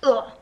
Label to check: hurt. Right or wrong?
Right